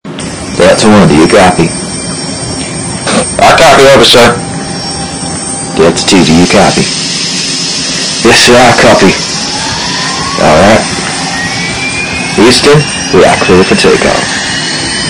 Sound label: soundscape; Wick